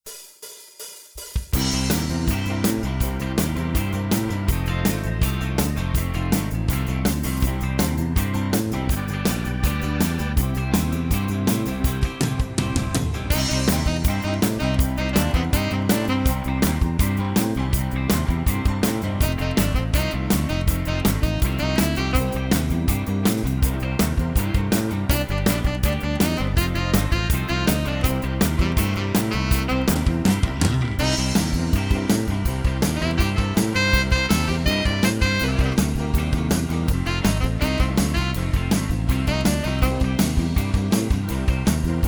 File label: old Rock